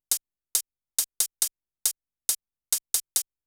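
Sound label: closed hi-hat, Techno 138 BPM; Best Service ProSamples vol.54; AKAI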